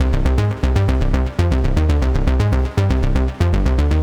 Main synth loop; Vostok Inc.

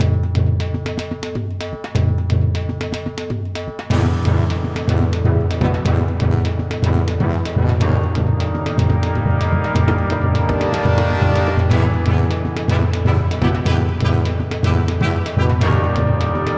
music boss

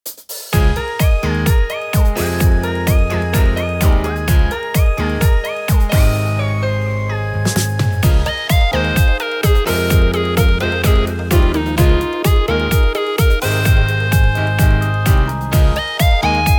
Music Event

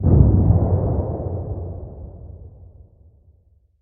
Cannon; Death

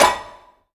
Button Mouse Over; Prison Architect

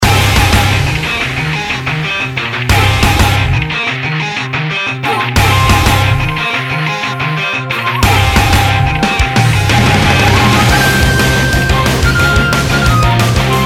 Raid Boss; Mist Train Girls

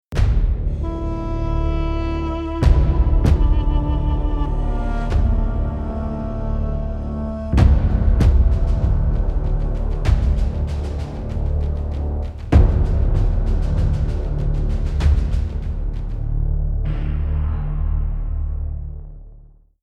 Mission Failed Stinger; Homeworld 3

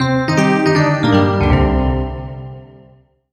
Solo EPiano Negative; MUSIC EFFECT; startide

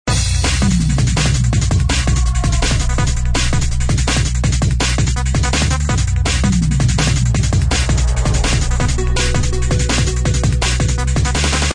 Loop; Chaos Faction

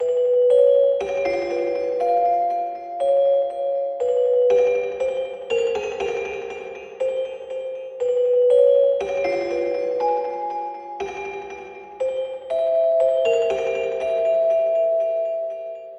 Celesta; Toki Tori 2

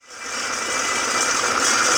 trophy spin; sports room sfx

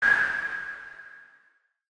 Freeze

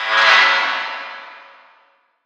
Jail Door Servo Unlock Distant

